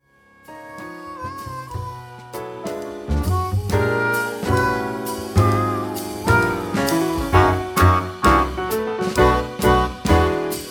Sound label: F Blues, harp